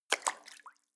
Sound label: step water sneak in